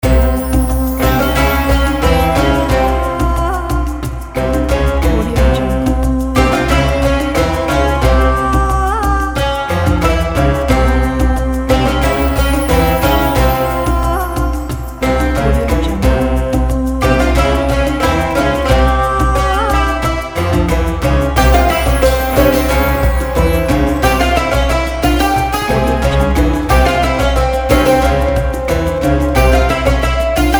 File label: middle east; luckyblackcat